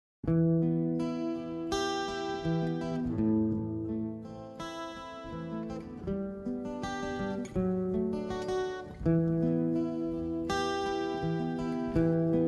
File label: Desert Blues World